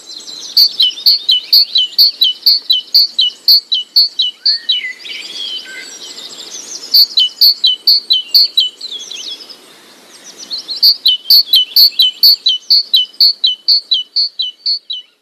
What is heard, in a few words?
Bird Sound